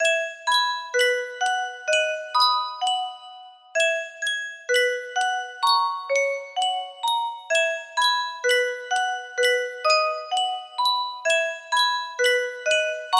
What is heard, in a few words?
Yunsheng music box